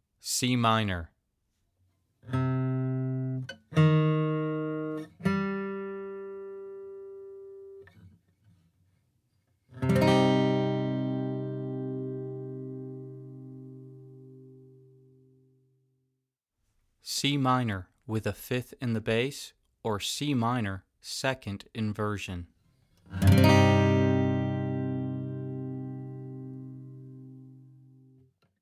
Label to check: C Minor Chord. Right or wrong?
Right